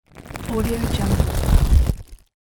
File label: plastic bag